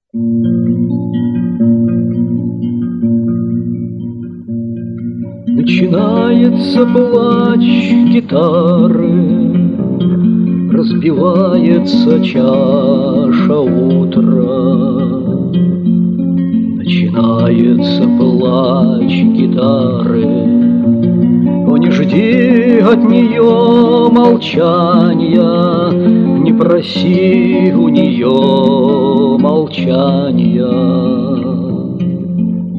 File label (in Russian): gitara; f.g.lorka; per m.cvetaevoj